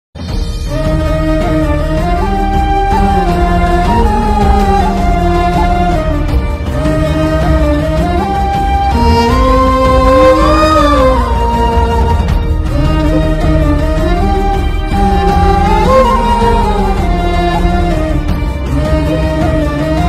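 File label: sad background music ringtone; ashokavanamloarjunakalyanam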